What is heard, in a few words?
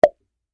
Foley, Champagne, Cork, Pop; Bars